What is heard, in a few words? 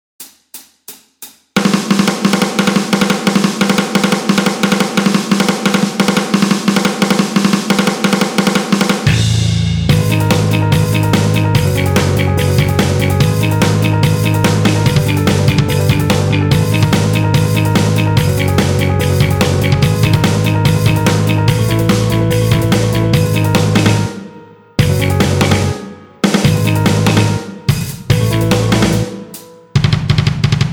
Backing Track; Hammer On Hell